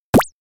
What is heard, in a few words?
TV switched on television turn on; film and tv